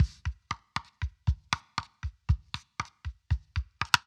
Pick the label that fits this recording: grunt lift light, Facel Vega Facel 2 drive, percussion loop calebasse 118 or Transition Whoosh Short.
percussion loop calebasse 118